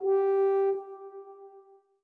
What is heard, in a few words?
orbit hornsolo; Sky Children of the Light gamerip